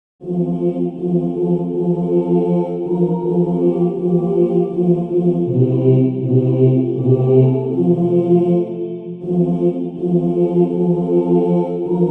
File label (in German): erlaube mir bass